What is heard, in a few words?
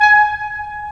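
wind clarinet; LA Composer vol. 3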